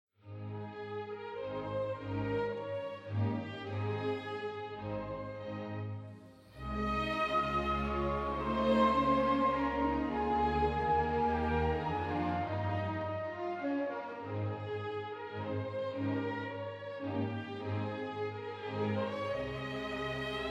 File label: Trumpet Concerto in E flat Major, Andante